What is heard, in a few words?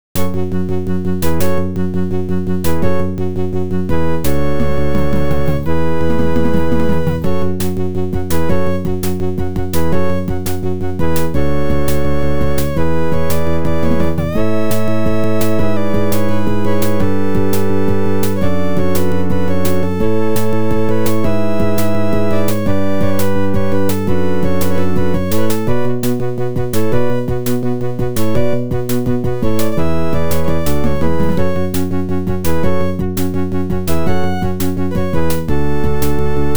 Sound Blaster; 4D Sports Boxing; Mindscape